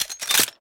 weapon pickup; weaponweights content

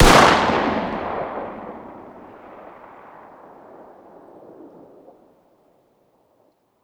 fire dist 9x19 pistol ext; gmod; arccw uc commons attachments

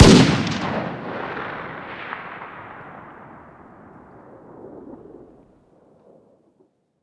fire dist 308 rif ext; gmod; arccw uc commons attachments

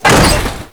impact soft, physics; gmod; lvs framework

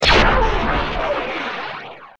fire turret; gmod; lfs luna s flight school; laatc atte

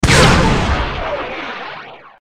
fire turret; gmod; lfs luna s flight school

